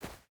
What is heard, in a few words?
footstep barefoot snow run; Rust